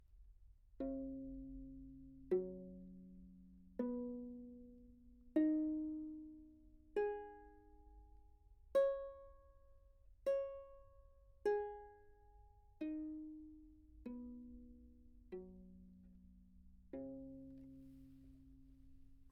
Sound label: treble viol back microphone pizz open, Anechoic